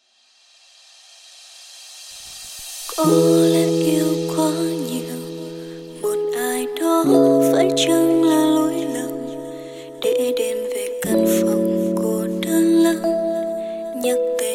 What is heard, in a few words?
Lofi Version